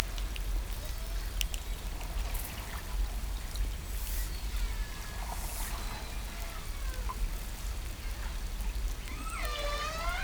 hydrophones, lime kiln

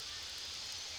Background Noise

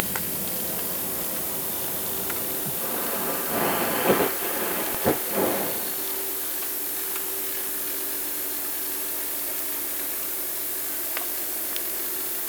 Orca sound Night Passby

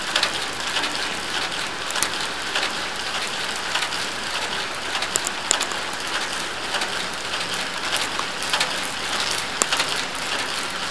Large Commercial Ship; Lime Kiln

